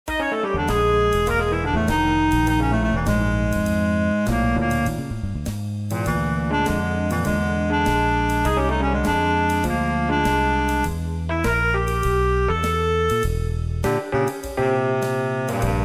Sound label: Quartet; Jazz